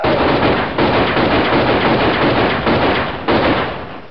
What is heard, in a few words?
gunshots eight